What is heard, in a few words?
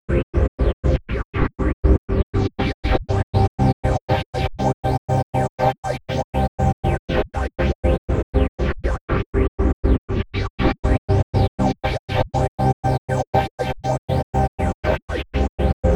Synth Chords; Eclectic Electronica